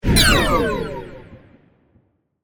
Laser Burst Power, Down; Science, Fiction; Lasers

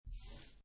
Playing Cards Poker Dealer Button Slide On Felt

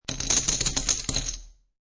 Poker Chips Drop Large Stack Of Chips Onto Table; Gambling Sound Effects

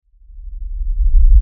LFE Reverse, Lfe Reverse Element Swell Low Bass; Swells; Blastwave

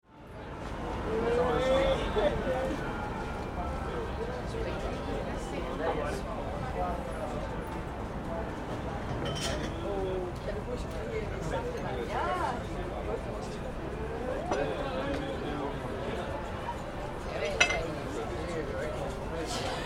Ambience Europe Cafe Streets Denmark; Restaurants (Sound Effects:Backgrounds)

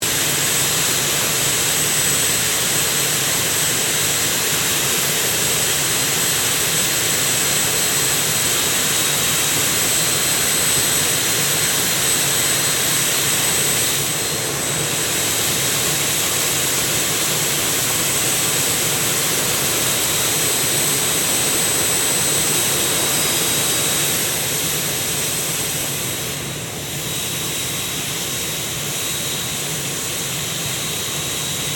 AIRCRAFT CESSNA A-37B DRAGONFLY IDLE; Dragonfly Jet Fighter /Bomber; Airplanes; Vehicles

